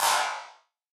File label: Wider Clap; stereo toolkit samples